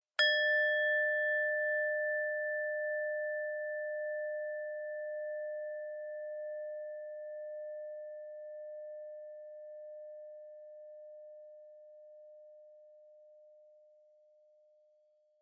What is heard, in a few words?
ring orin; anemoi